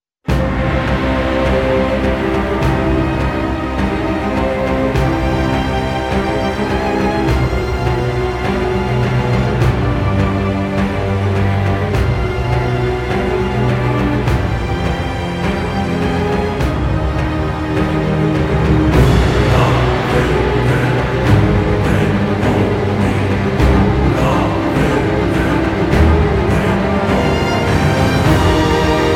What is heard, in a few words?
Orchestra